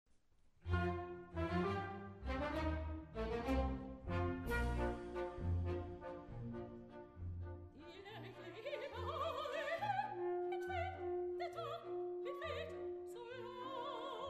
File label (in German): Walzer; Fruehlingsstimmen